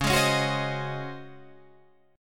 C sharp Major 7th Suspended 2nd Suspended 4th C sharp, Guitar Drop D